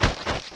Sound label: grapple, Melee; Weapons